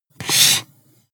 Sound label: marker mark hard